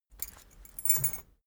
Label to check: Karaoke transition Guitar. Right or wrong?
Wrong